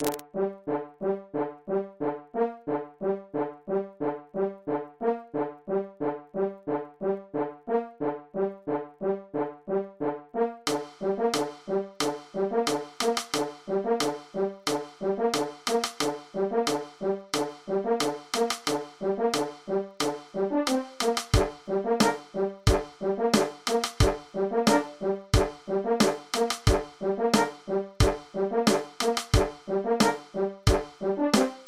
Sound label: Polka